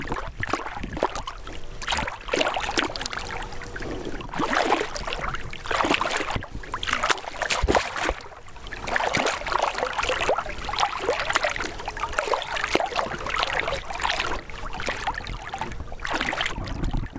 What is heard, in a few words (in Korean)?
물소리, beach